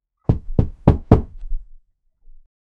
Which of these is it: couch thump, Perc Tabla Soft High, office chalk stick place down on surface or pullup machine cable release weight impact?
couch thump